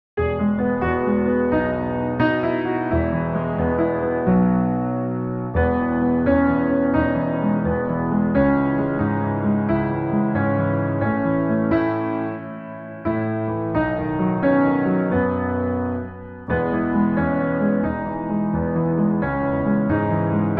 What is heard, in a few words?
Pour A Little Love 88 BPM in E No Vox